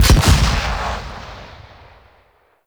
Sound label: Weapon Punch Rocket Crossbow; Heavy Weapons